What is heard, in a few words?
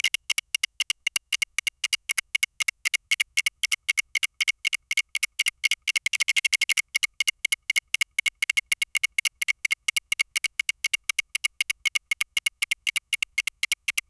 single isolate, time